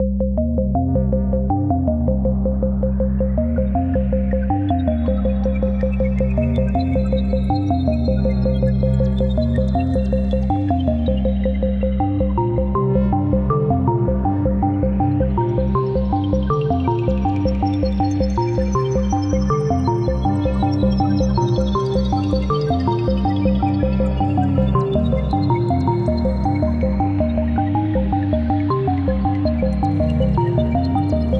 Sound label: Mad Organist